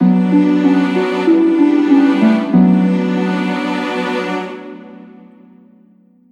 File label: Gameover; mercenaries wings the false phoenix